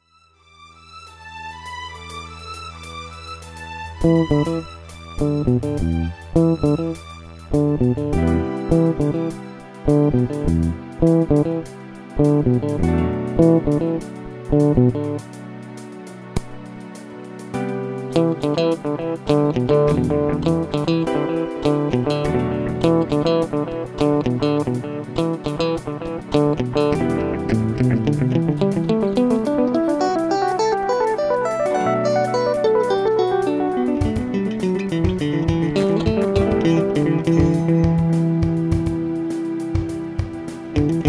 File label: Digital Delay; Joyo